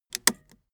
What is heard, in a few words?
car seatbelt unfasten soft